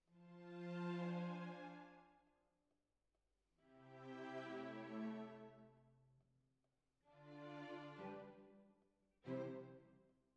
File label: Clarinet